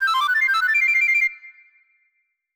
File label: Collect Giant Red Feather; Banjo-Kazooie